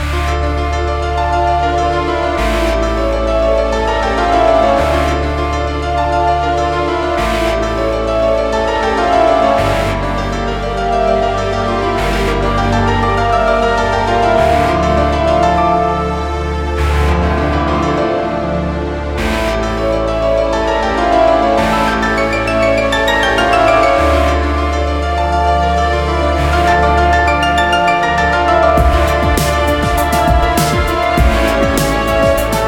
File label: Abstract Experimental Track; Dual Blade: Battle of the Female Ninja